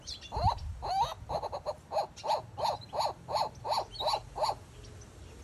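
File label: turako, turaco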